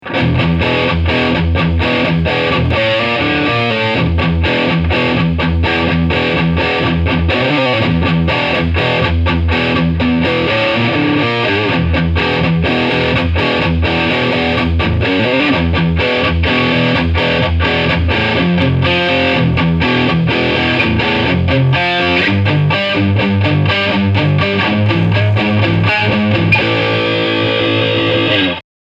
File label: Riff Distortion Morph USA